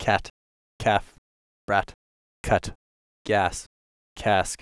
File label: cat stims